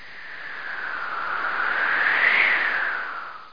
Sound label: wind